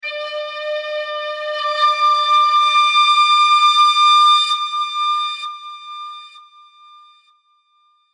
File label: Tin Whistles Octave, Multiple sound of tin whistles creating and octave shift in D.; Tin Flute